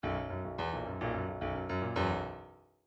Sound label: bdf midi file grooves funk n funk n